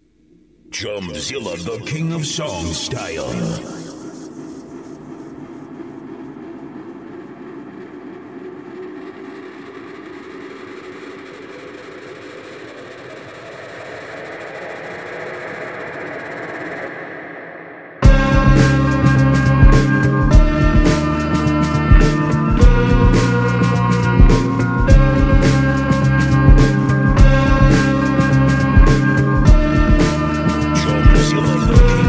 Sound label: Ocean 105bpm; ChumZilla